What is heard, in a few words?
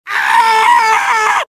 scream, pain death, male; Soldier Of Fortune II Double Helix; XBOX